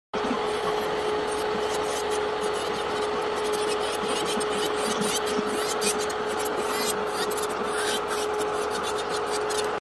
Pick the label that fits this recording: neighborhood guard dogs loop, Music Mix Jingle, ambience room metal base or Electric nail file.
Electric nail file